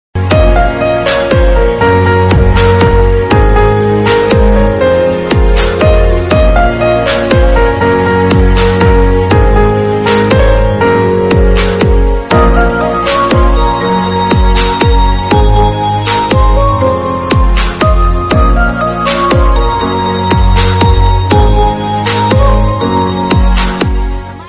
Instrumental; Kgf

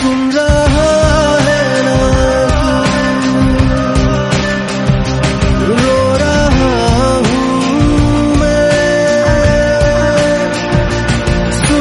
Male

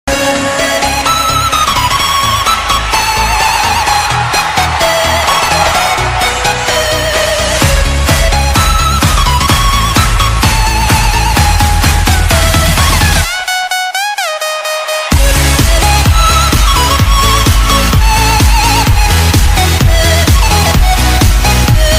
Instrumental

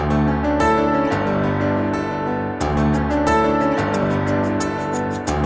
Tamil Piano Sms

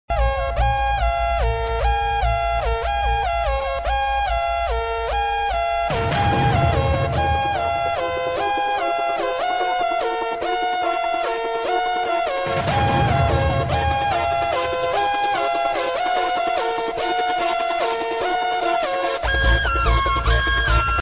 remix ringtone

